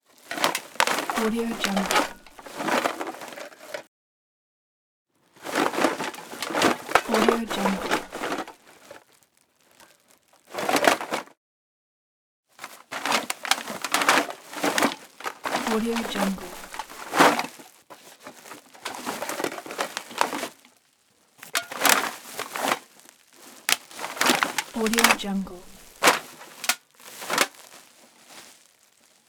rustle rustling through garbage

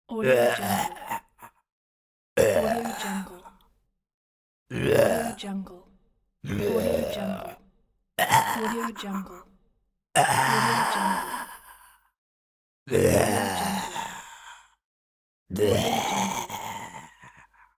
zombie dies

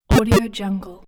8 bit failed

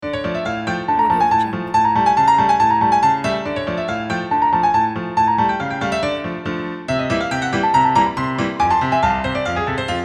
vintage movie piano logo

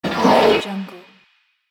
arrow swoosh

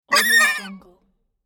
creature screech

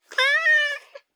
cat whining

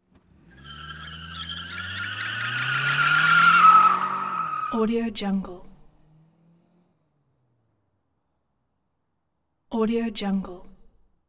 automobile skid and squeal accent

